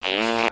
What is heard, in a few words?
reverb fart reverse